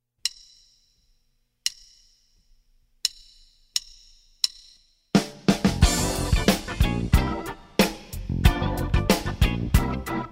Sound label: with drums